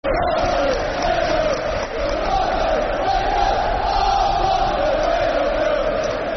Football Chants (Soccer Songs); We Love You Barnsley; Barnsley Bfc

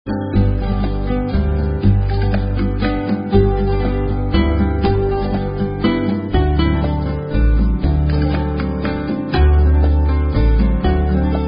Each Campfire Lights Anew, Karaoke Songs; Girl Scouts

